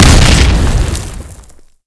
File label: explode; firearms